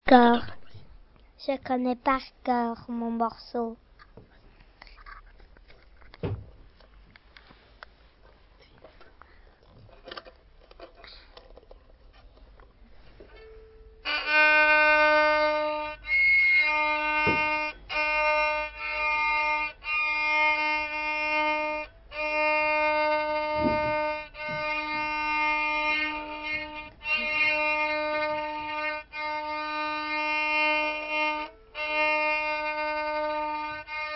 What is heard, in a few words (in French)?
violon; celine